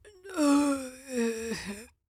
groan; godseeker

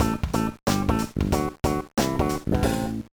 Jingle, gba

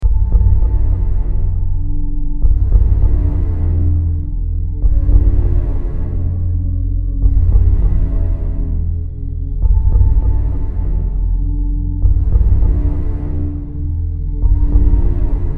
Background Dark Music; Avocuddle

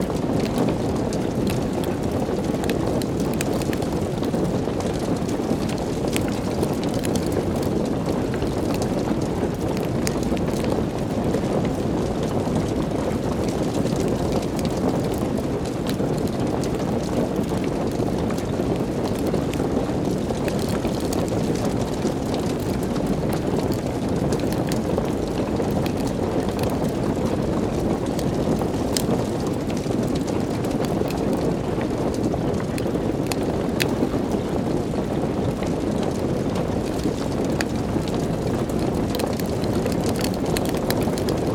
Atmo Soundloops Fire Torch

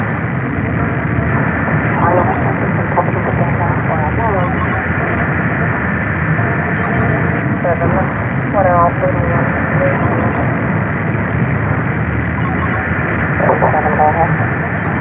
admin ambience; gamerip; shattered horizon 2009

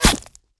bullet hit player; gamerip; shattered horizon 2009

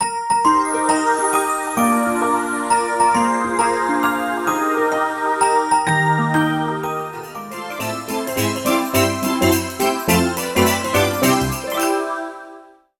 Jingle Birthday

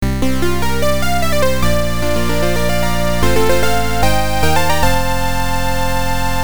Complete; battle ace; supergrafx

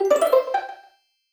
Jingle, Saved; Sakura Samurai Art of the Sword; Wii

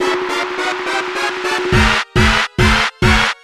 Sound Bomber - Clear; Mario Artist; Polygon Studio; N64

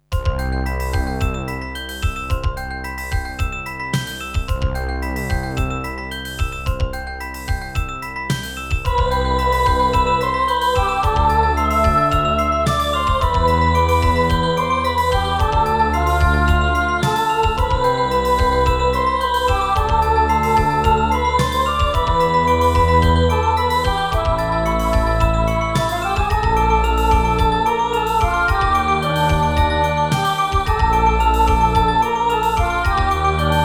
Synthesizer Version; Super Famicom Magazine Volume 12

